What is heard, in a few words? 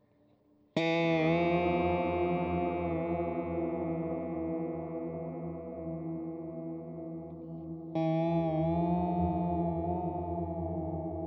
Walrus Audio Sloeer Dark Random